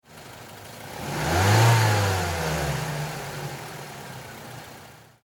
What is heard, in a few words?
Car Mazda 3 Medium Rev; Car 2009 Mazda 3 Medium Rev; Power Car sounds; Car Sound Effects